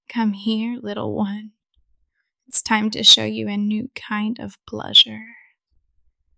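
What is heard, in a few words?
kondom, condom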